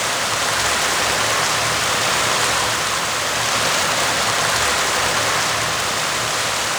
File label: Waterfall Medium; Midrath Island